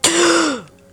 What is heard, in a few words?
gasp; angelyss